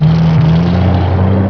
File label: Trucks Moving; Onslaught